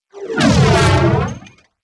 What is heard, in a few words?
healed; Hitman Mod